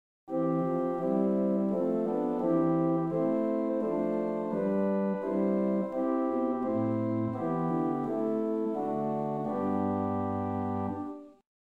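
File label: Swell Gambe 8; First Baptist Riverside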